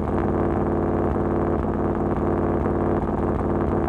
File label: hotrod, warthog, vehicles, loop, med; warthog left